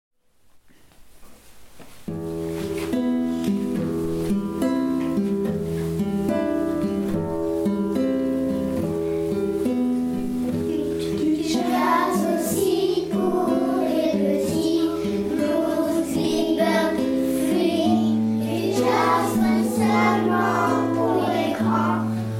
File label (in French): Du Jazz Aussi Pour Les Petits